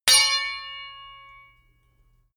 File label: sword hit sweetener